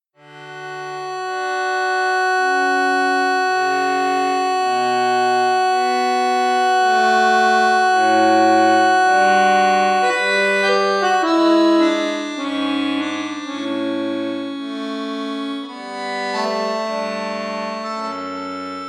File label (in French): registre 16 4, accordeon; bugari 580 ars c